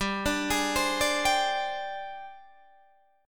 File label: Ab Major 7th Flat 5th G